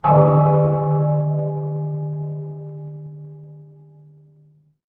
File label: instrument bell